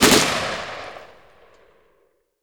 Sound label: ak 47 exterior shots